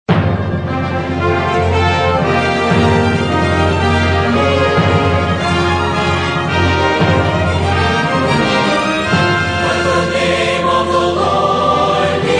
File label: Singers And Orchestra